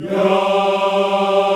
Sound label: VOX African Yas; Roland LCDP11 Africa VOL-1